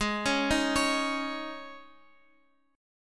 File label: Ab Suspended 4th, Guitar Open F; arpeggiated; Absus4; x,x,8,8,6,8